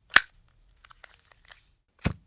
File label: Zippo Lighter Open Light; Fairlight Sound Lib